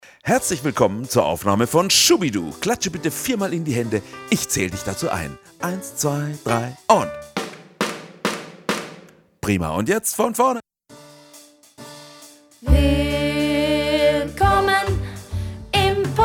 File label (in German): Playback fuer Video - Sopran